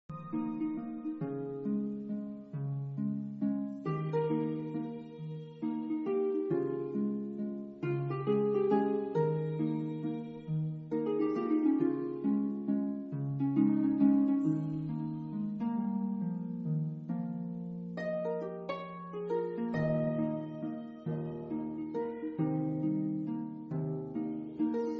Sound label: Exploration Instrumental; Coraline